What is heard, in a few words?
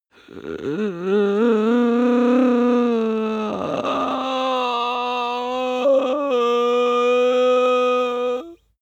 breath male inhale whine